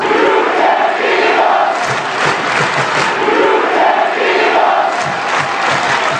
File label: you cant beat us